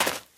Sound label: gravel, footsteps survivor walk; Left 4 Dead 2